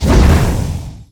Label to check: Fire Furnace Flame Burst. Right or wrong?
Right